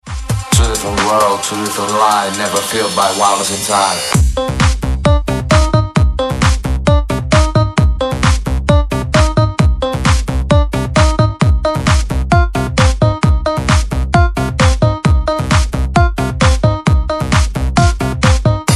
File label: rusty guit